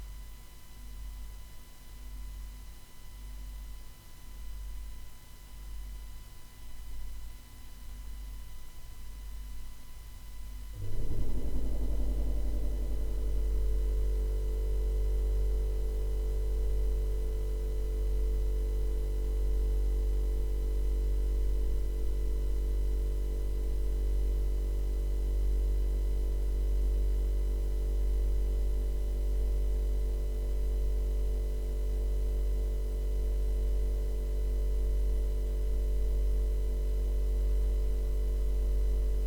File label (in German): kuehlgetraet zuhause, 03.00 (durch die wand); Fieldrecordings